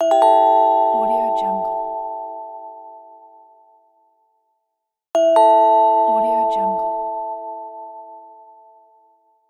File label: idea indication